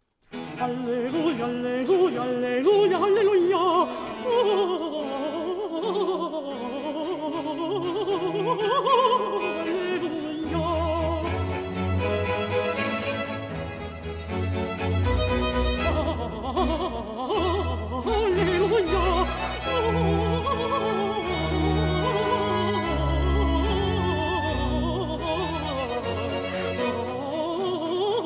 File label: Mottetto for Contrallto and Strings, Presto; Antonio Vivaldi (1678 - 1741); Mottetto 'Vos invito, barbarae faces' RV 811